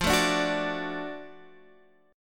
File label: Gb Minor Major 7th Sharp 5th, chord